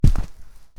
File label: Footsteps Rug; Backrooms 2D